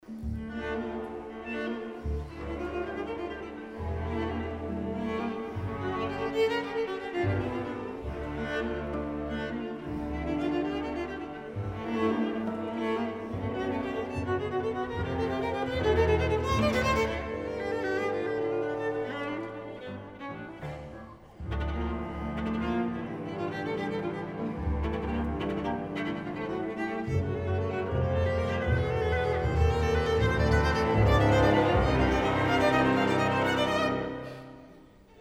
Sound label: VIOLA CONCERTO 2nd mov